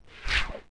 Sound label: slide; Infobase